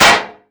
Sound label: MetalHit; Μεταλλικός ήχος; unity; fps